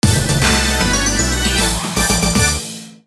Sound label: Mini Game Success; Fate/Unlimited Codes